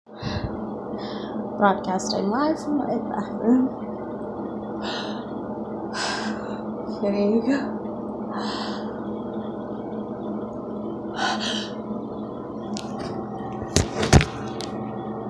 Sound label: I make weird guppy noises